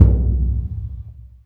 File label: Burundi dry, Heart of Africa; Spectrasonics